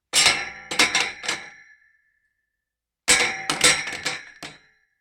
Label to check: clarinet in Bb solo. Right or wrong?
Wrong